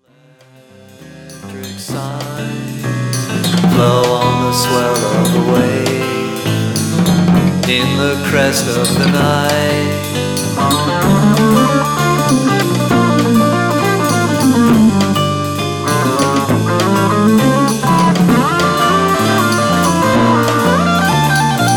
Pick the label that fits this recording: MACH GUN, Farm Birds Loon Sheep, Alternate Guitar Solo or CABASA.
Alternate Guitar Solo